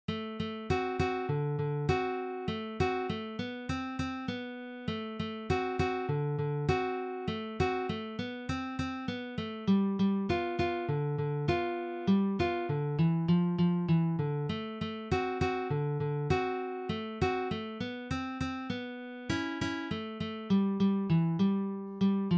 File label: rock n roll guitar